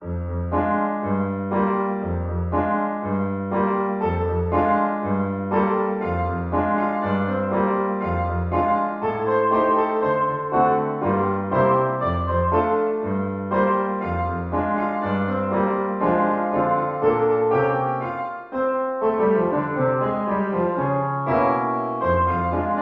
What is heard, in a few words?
piano duet; swing low sweet chariot